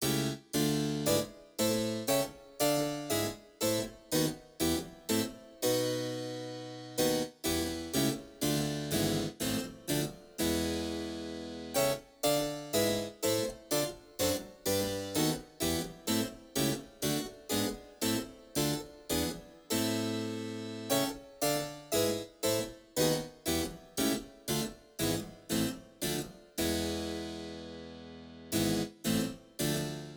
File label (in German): Sequenz impro